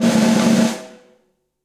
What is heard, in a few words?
Snare Press Low Loud Squashed; Snare Articulation; Drum Hits; Session Drums Club; Standard Hits